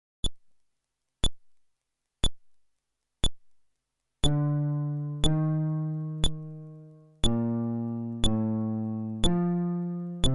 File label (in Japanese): フレットレス